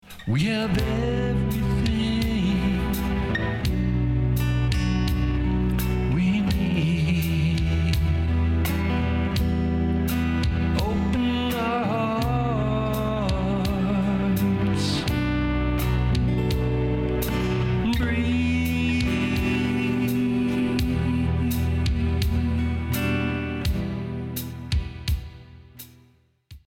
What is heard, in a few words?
breathe